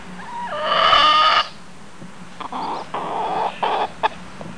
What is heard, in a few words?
roost; Professor Multimedia; video